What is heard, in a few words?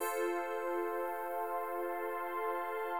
Complete Level; Mystery Mine